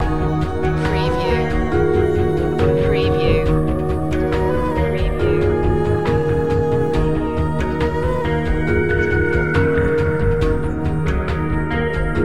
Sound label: urban grand loop; gloomy